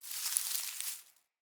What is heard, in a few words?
Forest Open Glade Grass Move Close; Day